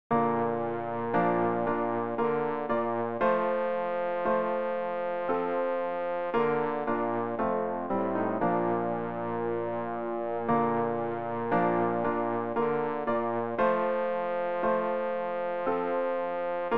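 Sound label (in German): bass, Übehilfen; Ref. Gesangbuch; RG 098: Du meine Seele, singe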